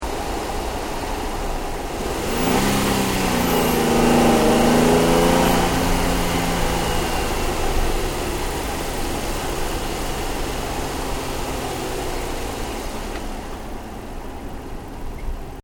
CAR NISSAN 350Z ENGINE BAY SHIFT DOWN 60KPH, manual sportscar; Cars; Vehicles